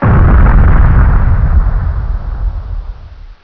explosion large

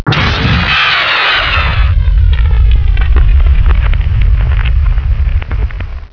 flyball fly; gearbox; flier

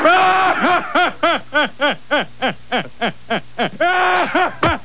hahaha; dmc

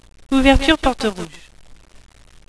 porte rouge; ambience

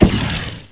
newburn, ambience; sa13

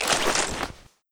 draw light, weapons

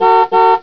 honk honk; tfc

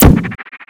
portal shoot; svencoop

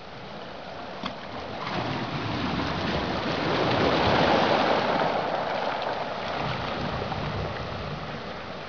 ocean

